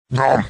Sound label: single nom